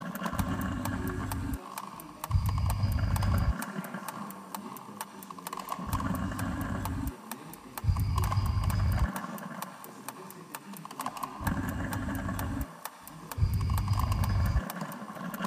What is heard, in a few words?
steam